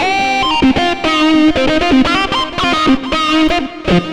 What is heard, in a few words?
xtra lead